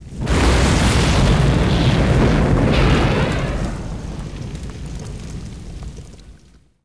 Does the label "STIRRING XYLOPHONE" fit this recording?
No